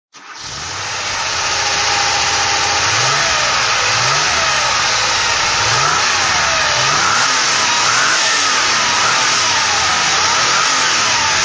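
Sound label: 911 sc throttle; Porsche 911 SC 3.0 Targa, 1979; Motorgeluid; Motorgeluid rijdend; V3D/ ClassicarImages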